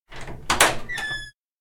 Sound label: door open; successmart; anemoi